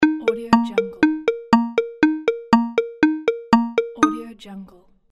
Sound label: funny cartoon time countdown